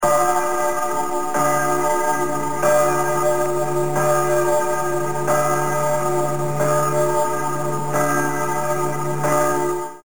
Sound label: Church